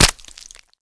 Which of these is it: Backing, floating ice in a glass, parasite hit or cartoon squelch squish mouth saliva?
parasite hit